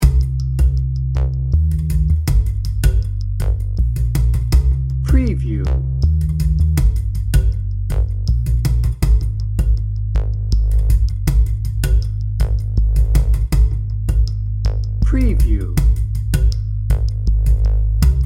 heartfelt dnb

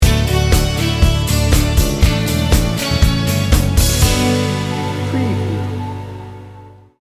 mellow pop rock sting bumper

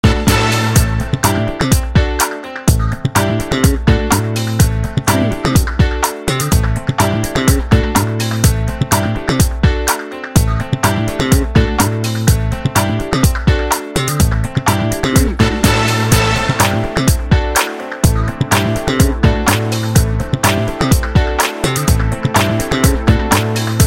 downtown funk bed

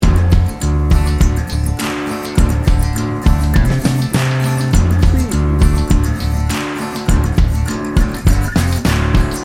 swamp boogie loop

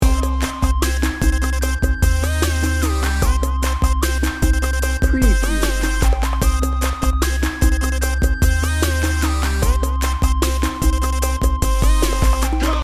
ghetto boyz loop

